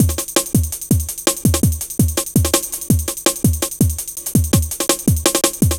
Step Off, drum loop, jungle, 165.5; Zero-G Jungle Warfare 1, 2 & 3

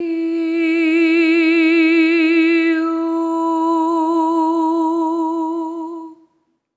EH OH E4, SOP; Spectrasonics; Symphony of Voices

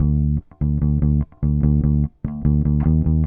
Bass, Indie Rock

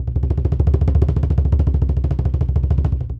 Bass Roll, African Drums, Bass Drum Rolls; Quazibeat; African Secret

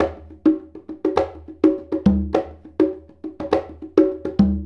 Congas; Essential Percussion

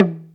TAMA, AFRICAN; AKAI S6000 CD-ROM - Volume 1; PERCUSSION

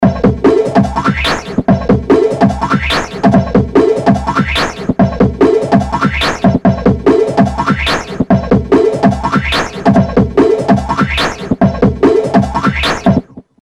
Filter Loop, Drum Loops; Industrial

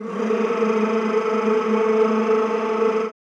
CHO Rrrr; Sound & Vision; Gigapack I CD 2; CHO Mmhh + Rrrr

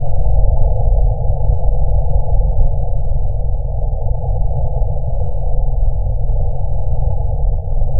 Drone Ambient; Drums & Drones

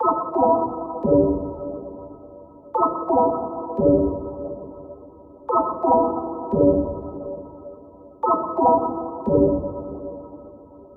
Lead; neuro; InsideInfo

